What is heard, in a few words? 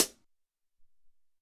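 Closed Hat, Alesis SR-18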